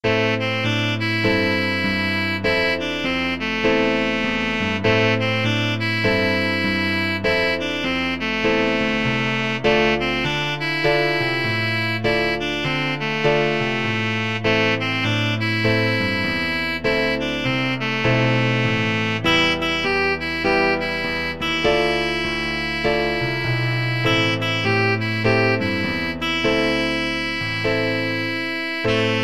saxophone

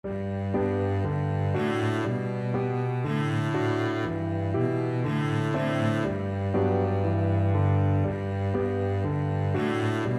double bass

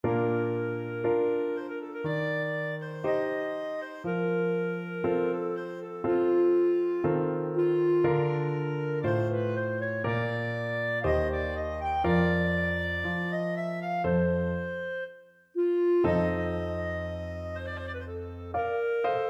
clarinet; beethoven op50 romance

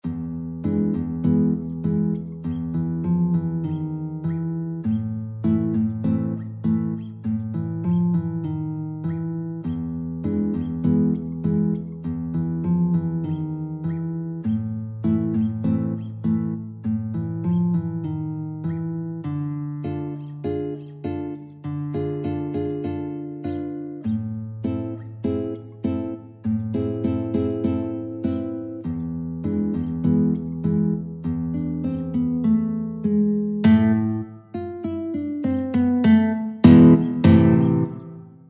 guitar

